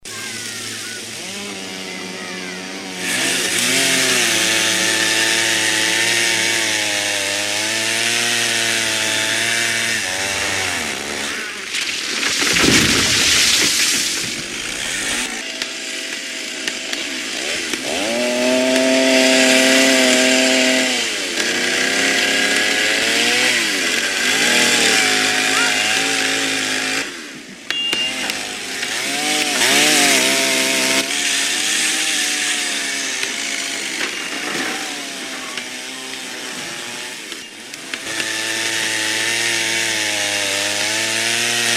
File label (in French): Bucherons dans jungle coupants plusieurs arbres